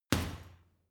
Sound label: boxing punching bag uppercut hard